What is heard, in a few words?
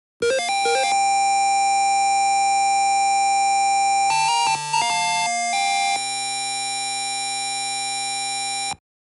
Dragon Horn; Kemco; The Sword of Hope II